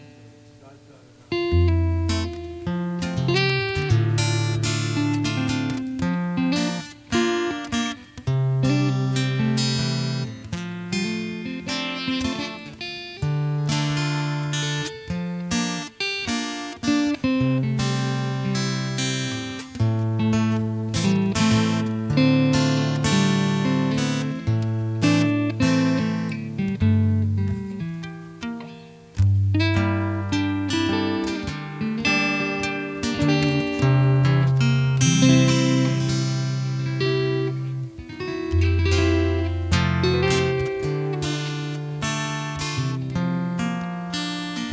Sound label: Jam